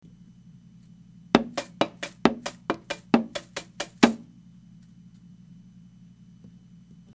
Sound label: pet taiko; 写真右二つの太鼓をスーパーボールとペットボトルのハケのバチでたたいています。; リサイクル楽器展示室U